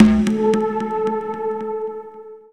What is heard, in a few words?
Haze Hit; Jungle; Blu Mar Ten - Jungle Jungle - 1989 to 1999 Samplepack